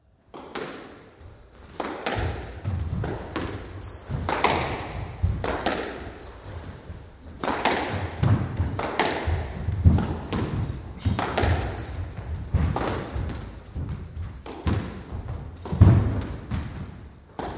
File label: Squash, Squash, game recorded from gallery with occasional speech; Miscellaneous Sport; BBC